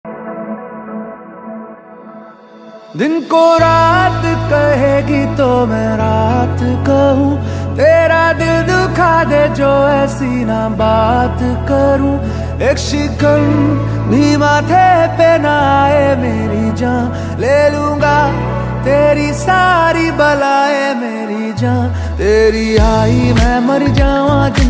instrumental ringtone trending ringtone bgm ringtone